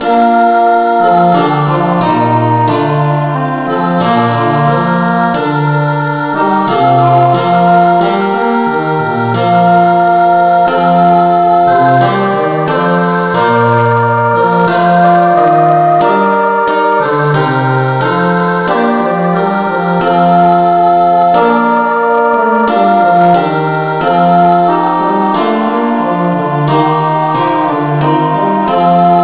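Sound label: no vocal, key b flat; christe Sanctorum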